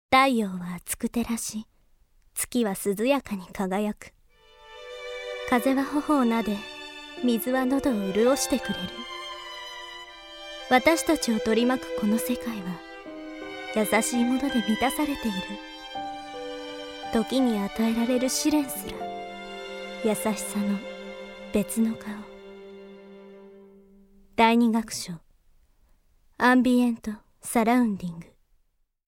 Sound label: ambient Surrounding; Kiddy Grade; Kiddy Grade Music; Sound Pack III; 2nd Musical